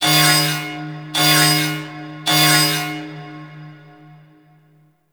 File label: Space Port Large Door Alarm; Venus Open World; Objective Rooms